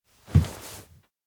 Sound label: turn; anemoi